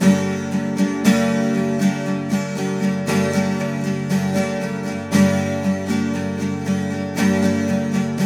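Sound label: 12 string minor G#, guitars; Spectrasonics; Hans Zimmer Guitars VOL-1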